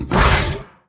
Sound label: attack